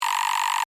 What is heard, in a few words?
Device Scanner, Readout, Scifi; Tech